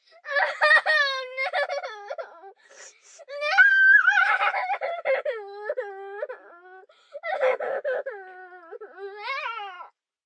Crying Despair, Crying, 1 girl, screaming, whining, human voice, kid, child, infant, female; Sound Effects:Voice; VoiceBox